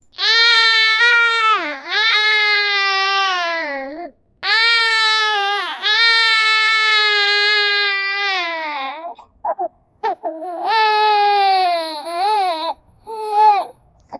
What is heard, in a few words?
the sound of a little, humans